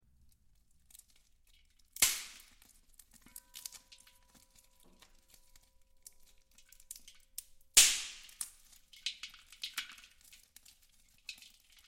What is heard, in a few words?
Soundscape